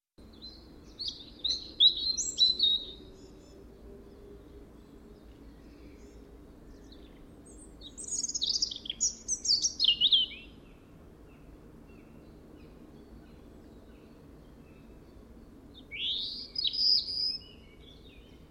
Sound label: Erithacus rubecula; SETE